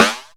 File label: Flange Snares